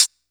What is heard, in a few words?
dry hat e key